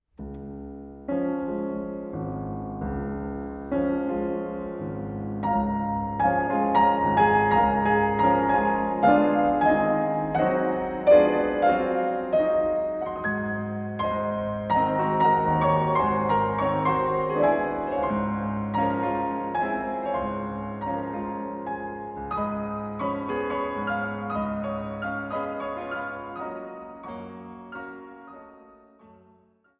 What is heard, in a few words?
Tango